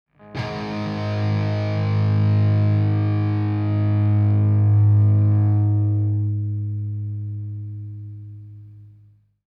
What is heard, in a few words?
power chord